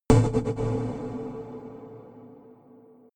energized fuse; whooshes-rises; hits-impacts

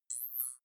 zoom in

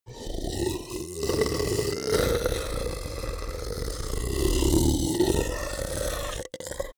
beast grunt warning angry; comedy-cartoon; soundpool

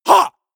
male combat attack short; schreie; menschen

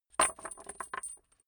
metal rattle wood drop

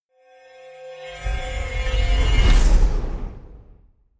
sci-fi vehicle metallic departure; raumschiff

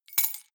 key ring large drop stone soft; objekte; schluessel

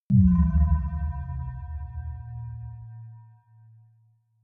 sci fi bell dull

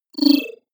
disappear flicker; maschinen; signale; soundpool

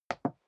step leather shoes wood parquet single stair down; schritte

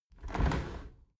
window handle squeak turn open halfway regular; objekte; fenster

